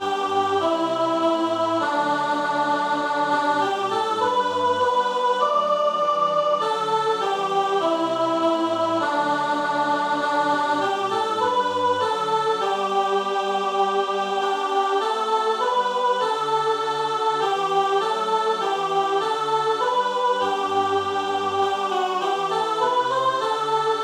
past three a clock Sop; bedfordmodernsingers

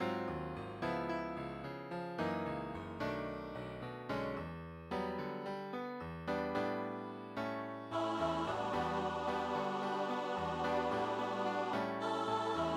kyrie Sop; bedfordmodernsingers

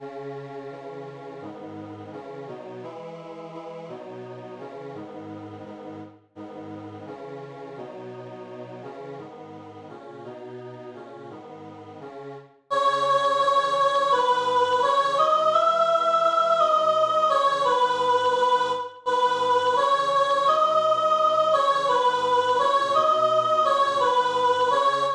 gaudete Sop; bedfordmodernsingers

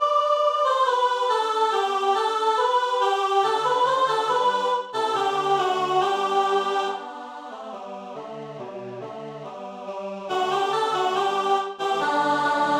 deck the hall Sop; bedfordmodernsingers

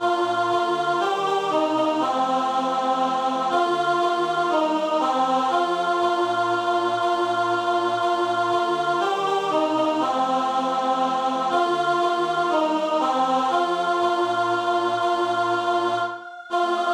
ding dong merrily on high Alt; bedfordmodernsingers

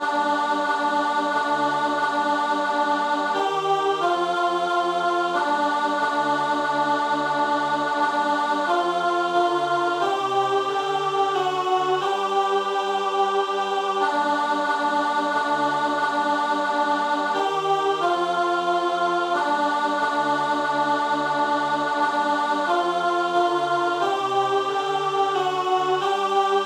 coventry carol Alt; bedfordmodernsingers

